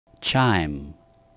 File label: chime